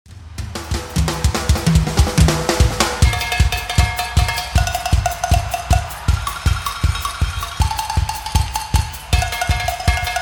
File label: unexplainable pattern and new cowbell lick